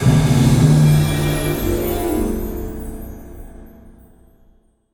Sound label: random Wild Loop End; fairyred